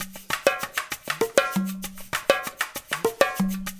LOOP, Percussion; InVision Interactive

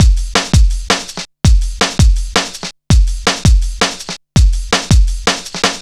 Drumloops, 165bpm; Drum Bass; Zero-G; Total Drum Bass

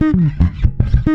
SLIPPER, bass FX loops; Spectrasonics; Bass Legends

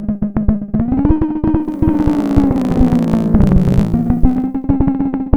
FX, Drum Machines; Fricke Schlagzwerg

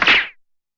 NOISE; DRUMS & PERC

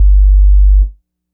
Dub Bass one shot, Basses; Electronic Point Blank

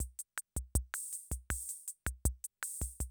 CR78, DrumLoops

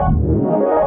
PIROUETTE, arpeggios; ILIO; Trance Fusion

